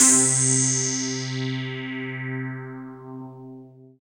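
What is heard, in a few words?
synthesizer, digital; Sound & Vision - Gigapack I CD 2 (Roland)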